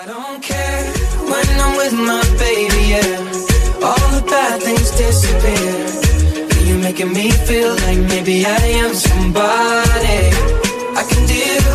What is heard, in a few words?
english ringtone